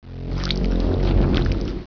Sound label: SHARK